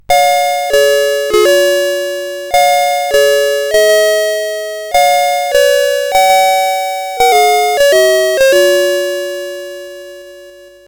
sid CSG8580R5, GAMES; Alice In Wonderland

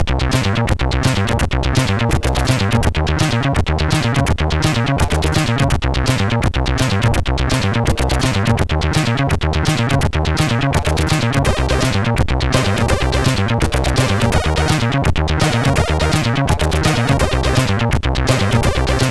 Intro Tune, sid CSG8580R5; HCL; hvsc

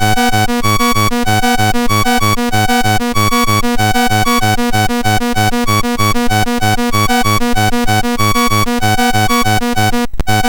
BASIC sid MOS6581R2; Alien Destroyer

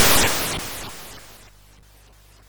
Frantic, MOS6581R4

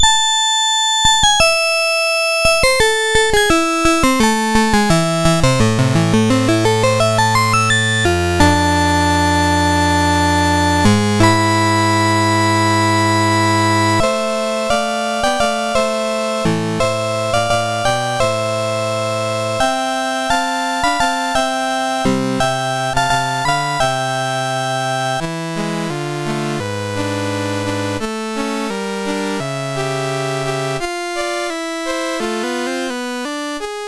Concerto in A Minor, MOS6581R4

